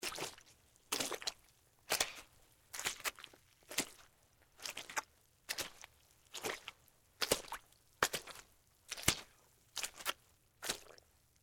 Mud Slow Splash, Footsteps, Mud, Slow, Water, Splash; Human; BlastWave